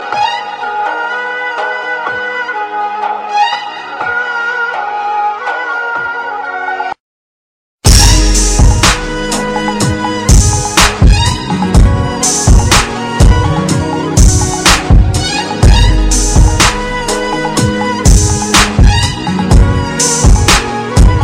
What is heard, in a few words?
arabic beat